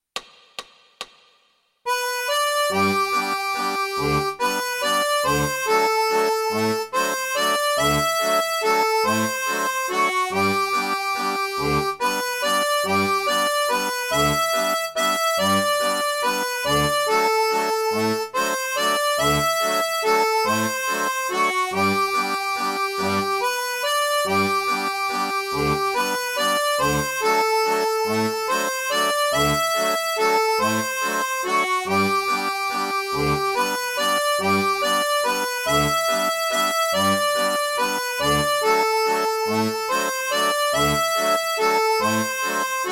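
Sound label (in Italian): L'Alba, Fisarmonica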